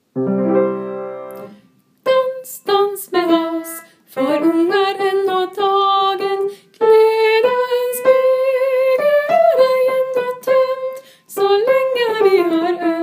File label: SOPRAN